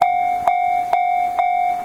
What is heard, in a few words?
crossing; tetsuon